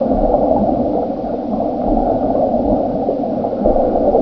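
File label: underwater; Terra; TERACIDE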